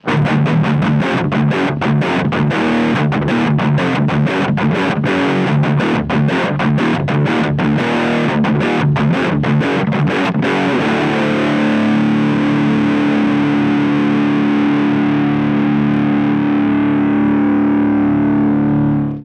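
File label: distortion mid, guitar; harley benton airborne go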